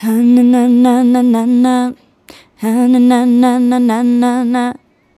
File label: Female Vocal; Sonic Foundry (Sony Creative Software); Mac Money